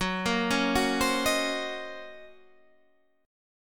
Eb Minor 13th Gb

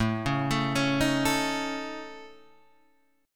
A Major Flat 5th A, chord; AMb5 Chord {5 4 7 6 4 5} arpeggiated

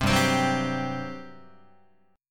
G Major 7th Suspended 4th, Guitar; GM7sus4; strummed

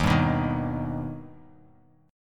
Eb Augmented Eb; strummed; JGuitar

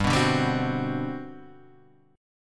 G Major 11th, chord; strummed; JGuitar

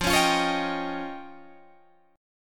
F 7th Suspended 2nd Sharp 5th, Guitar, Drop D

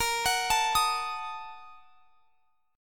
A sharp Major 7th Suspended 4th Sharp 5th; arpeggiated

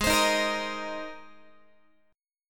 Ab Major Add 9th Ab Guitar; Abadd9 strummed; JGuitar

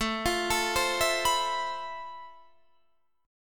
F Major Sharp 11th A

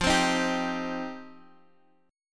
F 7th Suspended 2nd F

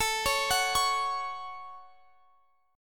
A 6th A, chord

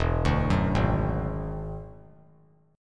E 9th E down; E9 arpeggiated; JGuitar

